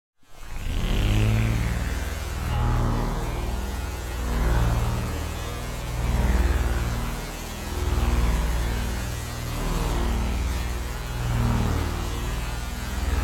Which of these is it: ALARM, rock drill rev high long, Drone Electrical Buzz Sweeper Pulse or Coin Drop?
Drone Electrical Buzz Sweeper Pulse